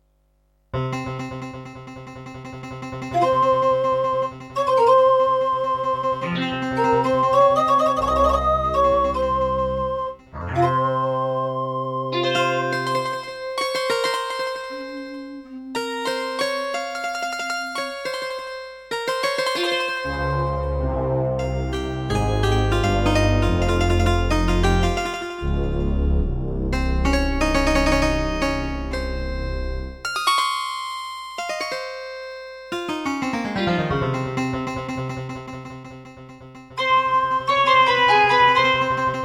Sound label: Creative Midi Blaster MB10; Prince of Persia 2